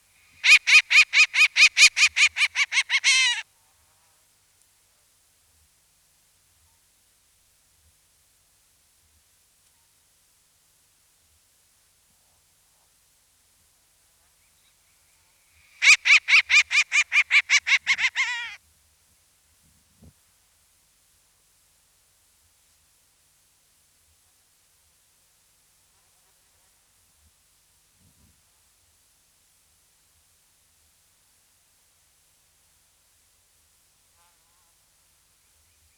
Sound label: Short-eared Owl